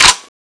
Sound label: m4 bolt release, weapons